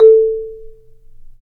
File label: Marimba; Roland LCDP11; Africa VOL-1; Af.Marimbas